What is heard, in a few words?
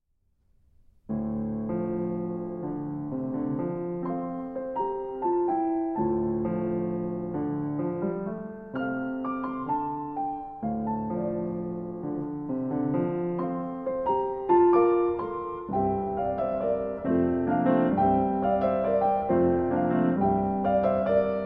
Piano Duet Andante con moto; Edwards; Nine Bagatelles For Piano Duet